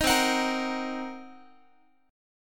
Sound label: C Minor 7th Flat 5th C down Guitar; Cm7b5 strummed; JGuitar